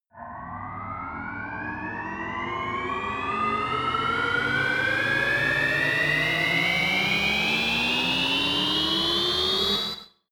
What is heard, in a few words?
turbine start